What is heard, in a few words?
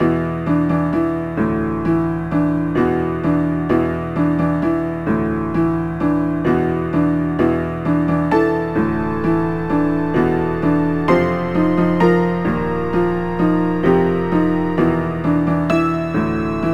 Dance Rumba Slow; knigge spielend zum guten benehmen; nds